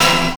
HIT

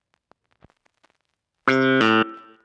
SYNTH 90 A; old rec

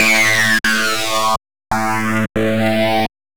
ELECTRO SYNTH 140 A

